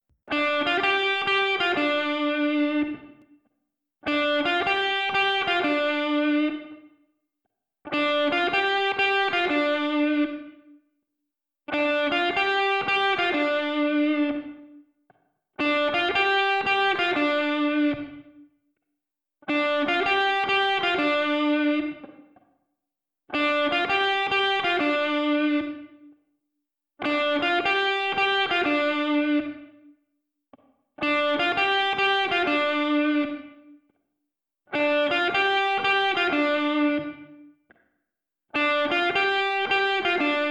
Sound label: ISOLATED melody guitar live recording